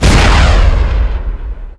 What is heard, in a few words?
redeemer shoot; Monster Hunt